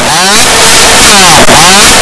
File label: strech cow